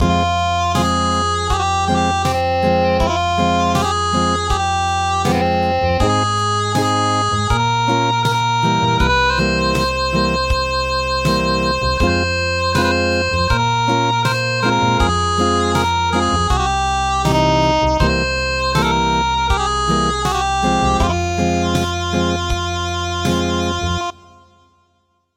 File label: Acoustic; Armenian lullaby